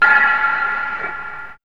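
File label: synth hit; E-Lab; X Static Goldmine 1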